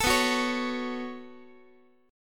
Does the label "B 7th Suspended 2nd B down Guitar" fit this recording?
Yes